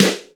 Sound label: Sparkle Snare Single Hit F# Key, snare tuned to the F# note